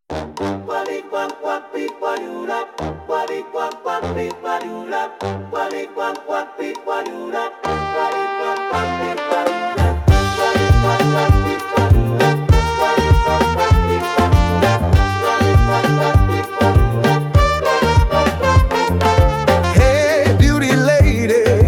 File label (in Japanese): サンバ